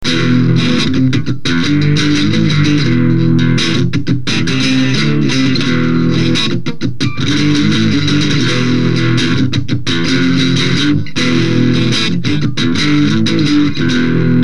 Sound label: crunch, guitar; mark5